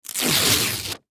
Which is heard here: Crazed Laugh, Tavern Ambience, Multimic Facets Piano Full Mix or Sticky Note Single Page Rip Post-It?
Sticky Note Single Page Rip Post-It